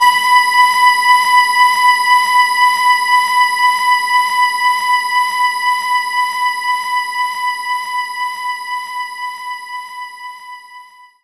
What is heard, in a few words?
Synth Vox Aahs B5